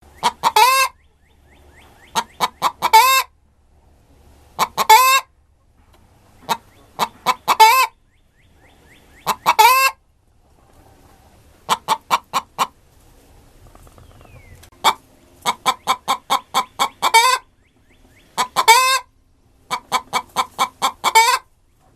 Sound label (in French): chant poule ponte